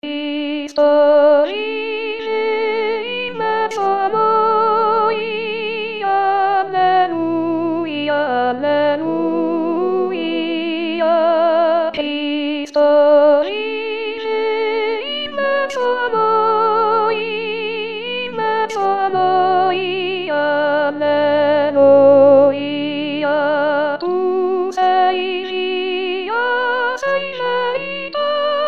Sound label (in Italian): soprani; voci guida; corali in vaticano 2024